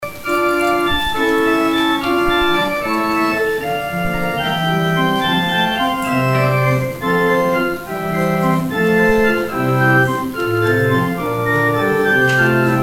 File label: organ interlude; Gigue - Handel; St. Mungo's